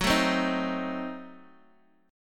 F sharp Diminished 7th F sharp, Guitar Open D; x,x,4,3,3,1

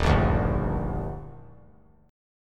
D sharp Major 7th; strummed; JGuitar

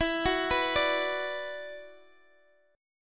E 7th Suspended 2nd, down, Guitar, Open D

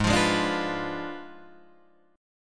Ab Diminished 7th, down, Guitar, Drop D; strummed

